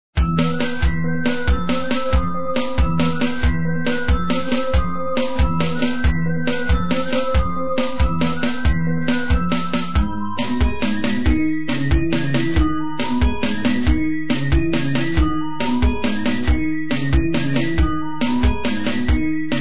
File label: Loop Fast; Trick or Treat Smash Online; Windows